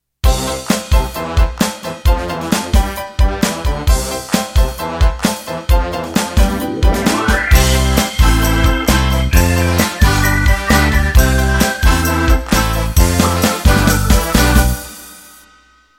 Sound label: Chalypso Intro